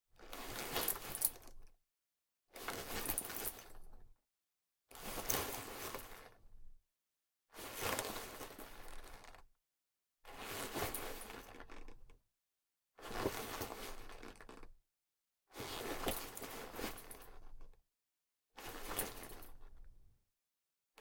Clothes Leather Jacket Movement Rustling ShotgunMic; Guild Wars 2 (Original Foley Soundtrack)